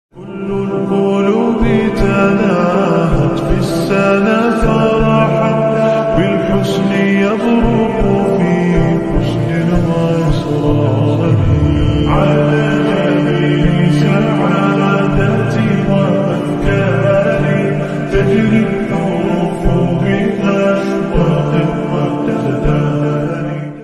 wedding nasheed islamic

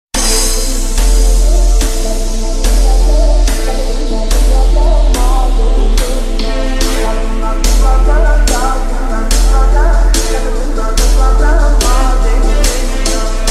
Arabic Remix Slowed Reverb Bass Boosted; Tiktok Trend 2024

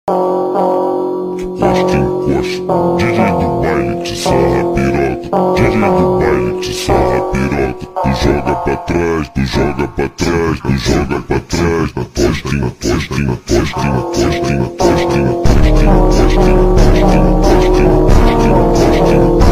beat slowed

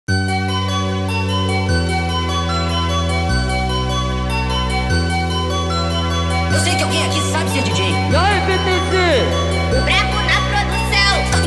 sped

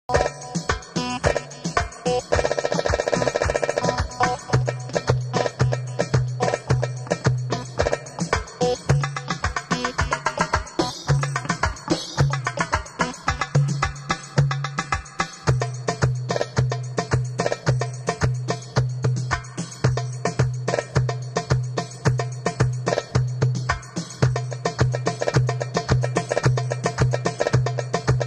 darbuka solo; kolbasti

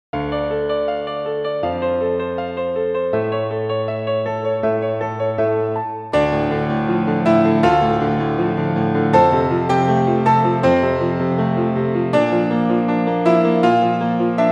piano; gravity falls; weirdmageddon

